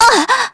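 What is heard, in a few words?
Vox Damage, Korean; Jane L; kingsraid; voices heroes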